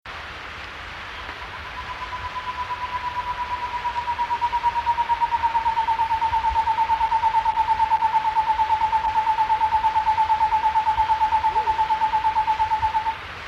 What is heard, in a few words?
Cloud-forest Screech-Owl; S. America